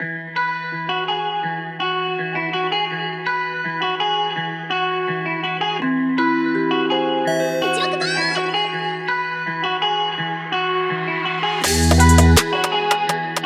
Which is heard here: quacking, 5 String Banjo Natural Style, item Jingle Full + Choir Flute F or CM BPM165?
CM BPM165